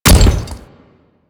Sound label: Step Default; Warframe; Grineer Ghouls; Ghoul Devourer; Footsteps